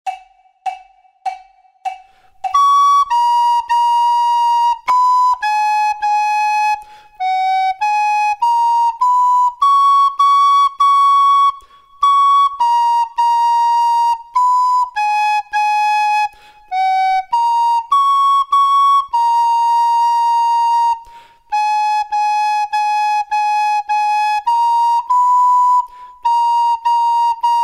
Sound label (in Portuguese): DUETO FLAUTA